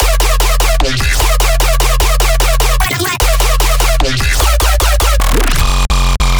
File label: bass loop trapping 150 F; Virtual Riot